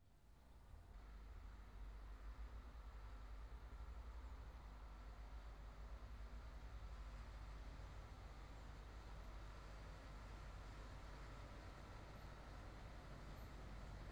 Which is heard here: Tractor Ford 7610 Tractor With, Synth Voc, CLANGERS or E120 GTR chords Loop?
Tractor Ford 7610 Tractor With